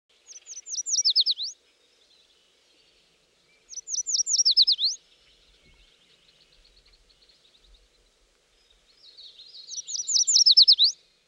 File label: warbler yellow, birdsong